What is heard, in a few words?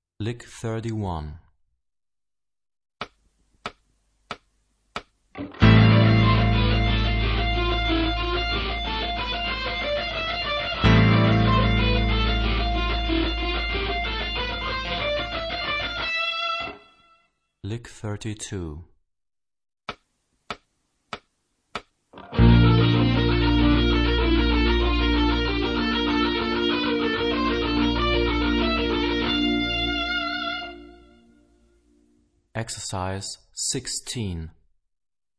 Rock guitar; Rock gt Secrets